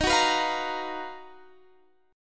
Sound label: B Major Sharp 11th Eb